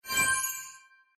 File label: energy upgrade